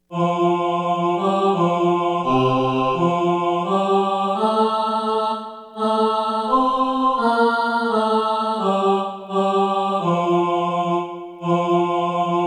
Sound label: just as long satb, choir